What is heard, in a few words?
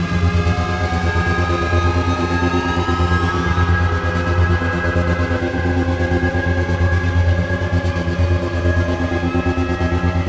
Music Encounter High Pulse Peak; Battlefield 4; Chrome